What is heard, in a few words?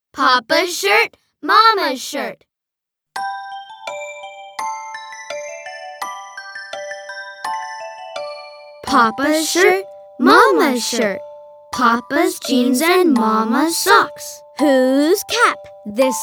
CHANT